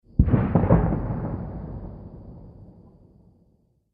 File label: War Sounds Explosions, Bomb Sound Effects; War Sounds - Explosions 01